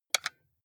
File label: console controller xbox xyab press hard